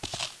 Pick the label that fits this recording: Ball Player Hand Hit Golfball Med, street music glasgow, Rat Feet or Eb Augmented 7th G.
Rat Feet